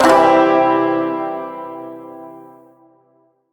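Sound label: Shawzin Major Chord A; Chords; Warframe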